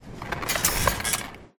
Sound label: Rope Swinging; Poppy Playtime; Extendo Hands